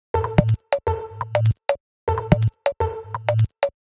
Top D 124bpm, House Drumloops; Zero-G House Fabrik Drumloops

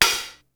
hi-hat, Hip Hop DRUM; InVision Interactive; 40 Oz Phat Slammin Hip Hop

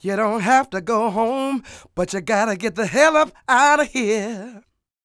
SING, Vocal Planet Blues Soul BLUESY

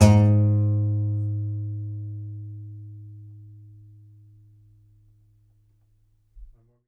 C. Bass Balalaika, Plucked Strings

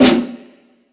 Stadium Rox, Drum Machines

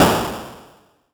Elektron Monomachine, Drum Machines

